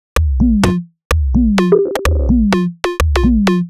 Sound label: Nibble 127bpm, House Drumloops; 90 s Sample CDs; Zero-G House Fabrik Drumloops; ZG Drumloops